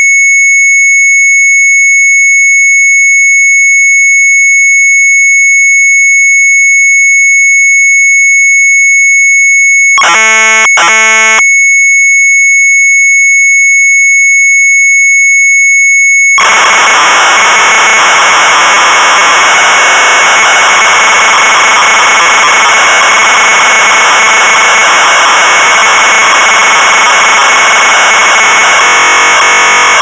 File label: Sharp MZ800 Tape; ROULETTE; retromelsarchive